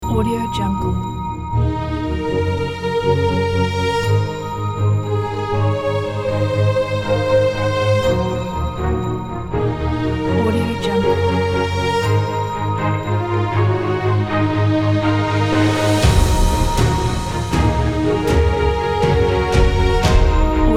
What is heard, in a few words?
corporate inspiration